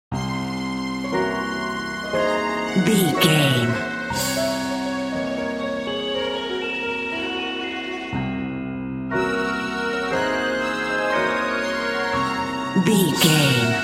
trapped dream

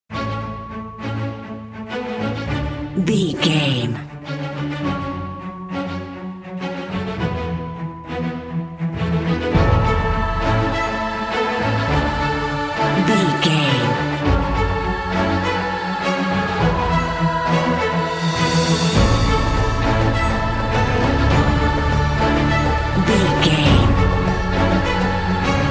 cinematic corporate main

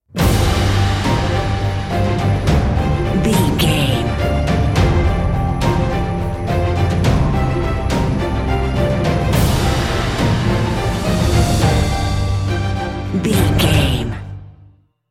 epic cinematic

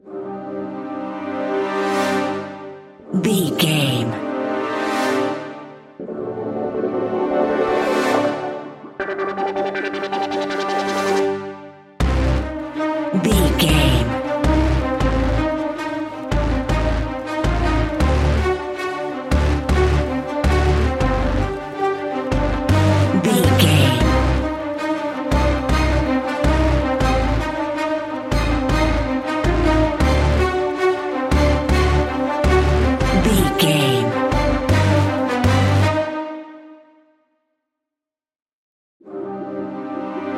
foothold no drums

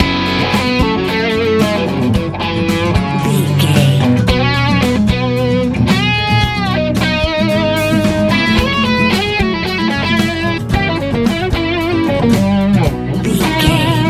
fun big warm airplane ride dreams easy pop rock

